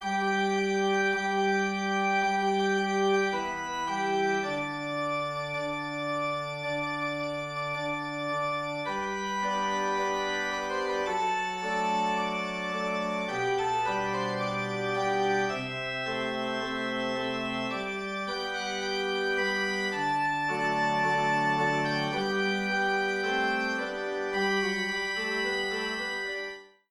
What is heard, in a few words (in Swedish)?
Brudmarsch klar